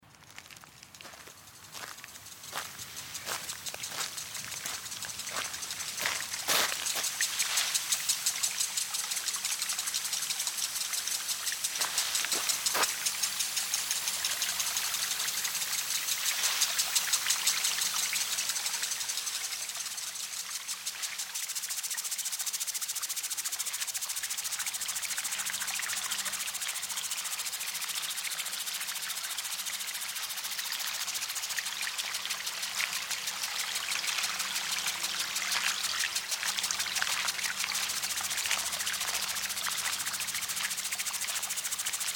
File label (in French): jets eau